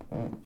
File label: Stairs footsteps; Phasmophobia